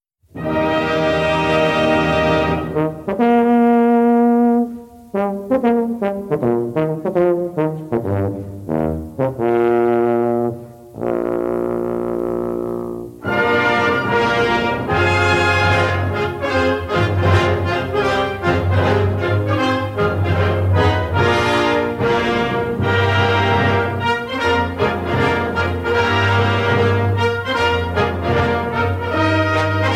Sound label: Horn Concerto No. 1 in E-flat